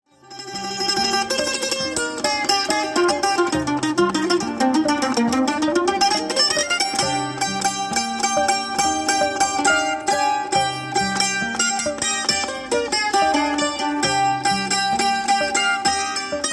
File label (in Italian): mandolino